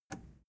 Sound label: plastic button scratch release enable